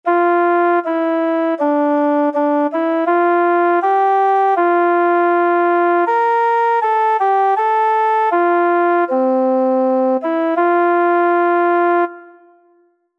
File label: 2 4 time organ; Meter